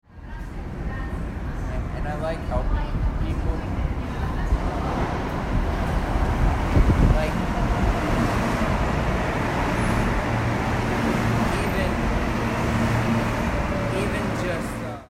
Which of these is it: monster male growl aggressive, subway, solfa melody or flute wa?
subway